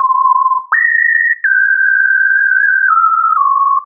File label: Sinuzoid, big beat